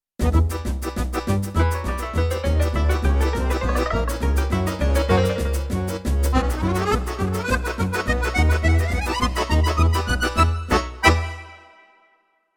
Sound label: Balkan Musette